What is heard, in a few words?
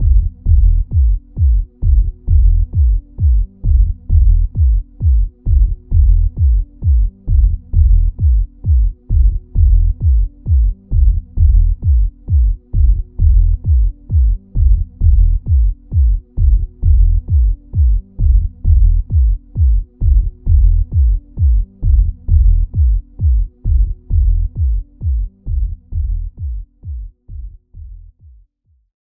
Intruder Alert Muffled; Bionic Commando Rearmed